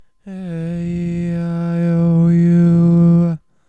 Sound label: aeiou Mid; A E I O U Sung in the middle range